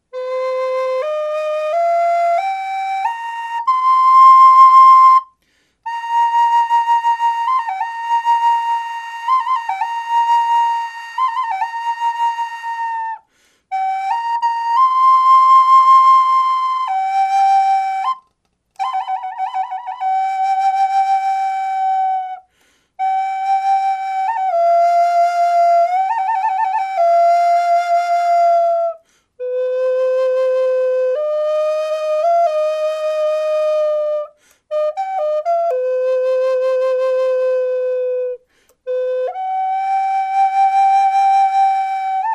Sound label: Cedar Classic